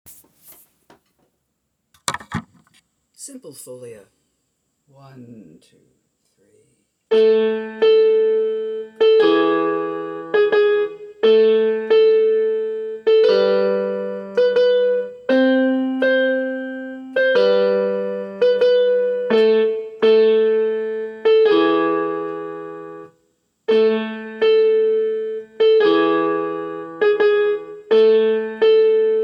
Folia melody rhythm

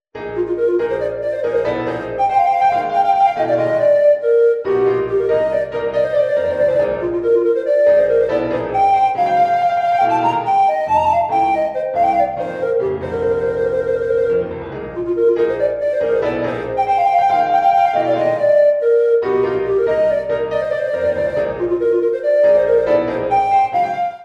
Fanfare Soliloquy for Treble Recorder Piano

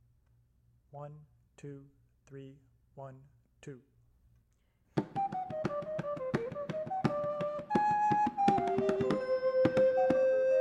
for 2 recorders and percussion

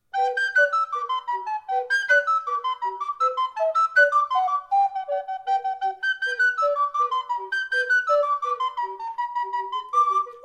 Carols, recorder

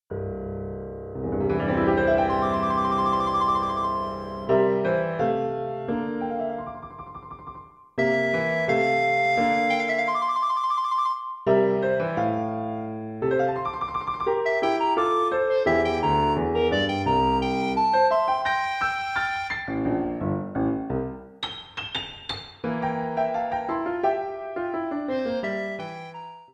Duet for recorder and piano

